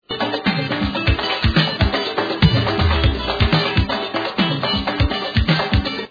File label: conga